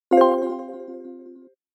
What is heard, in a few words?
ui notif enter